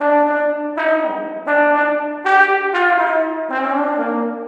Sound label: Trombone Effect, effected trombone